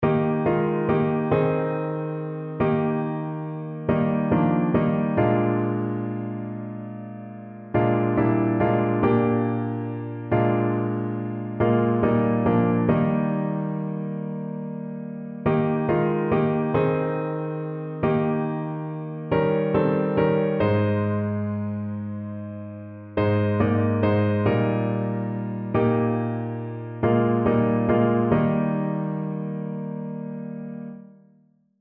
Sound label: piano